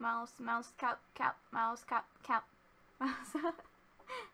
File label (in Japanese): 笑い; Mouse Kappa